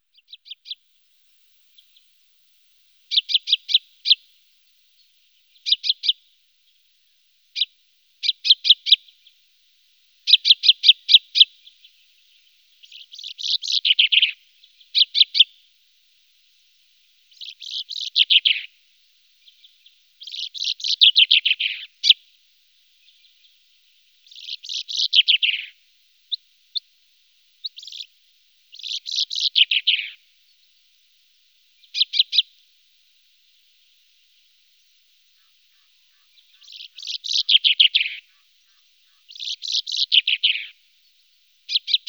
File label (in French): Pinson des arbres